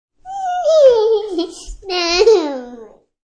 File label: Tdllr Giggles, A toddler giggles.; Laughter; Voice